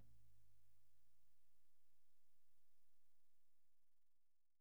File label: YAM18, drums; Big Fish Audio; Ross Garfield Drums VOL-2